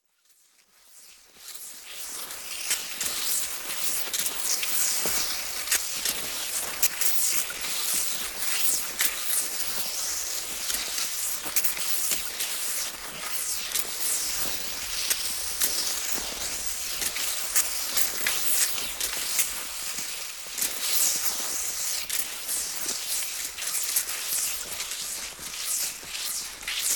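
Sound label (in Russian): Лыжи палки кросс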